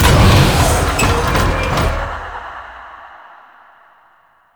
Elevator Open; Warframe; Tau; Circles Of Hell

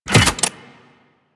Briefcase Launcher Reload Passive A, Weapons; Warframe; Corpus

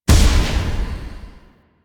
Lotus Hand Projectile Die; Warframe; Weapons; Duviri

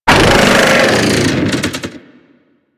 Thumper Pain Groan C; Warframe; Grineer Thumper Bot

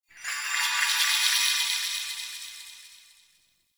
Magic Bells; Midrath Island; Fey Hub

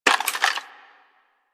Shotgun Reload Handle Foley; DE; Warframe; Weapons; Skins; Zoboz Shotgun Skin

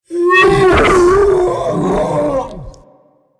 Ancient Tank Pain, Infested, Barks; Warframe

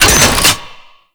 Front Line Ammo Pickup; Tau; Twelve Minute War

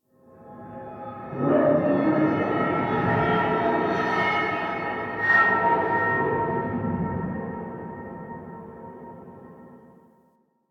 Metallic Drone; Warframe; Ambience; Nineteen Ninety Nine

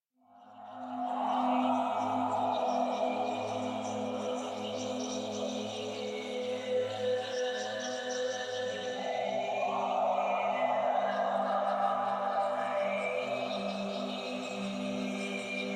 Choir; Sentient; Warframe; DE; Sentients

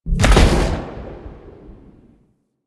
Iron Blast Cast Low; Warframe; Warframes

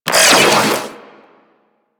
Proc Magnetic; Mods

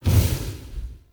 Invis Rune Smoke Melee Sweep; Powers

